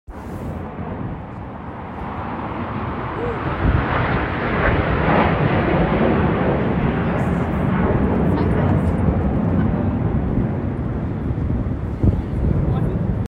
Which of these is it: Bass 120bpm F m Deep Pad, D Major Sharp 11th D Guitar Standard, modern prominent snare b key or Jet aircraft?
Jet aircraft